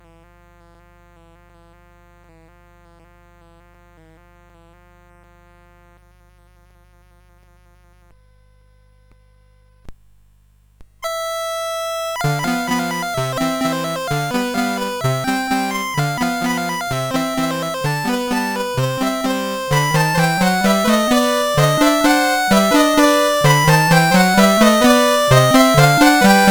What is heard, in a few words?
Pappys Polka CSG8580